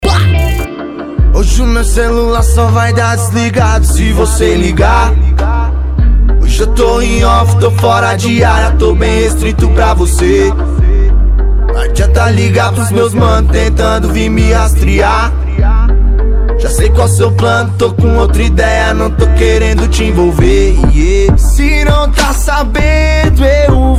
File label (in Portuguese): rap